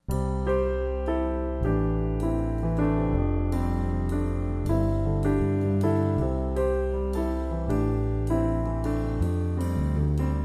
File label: With Trio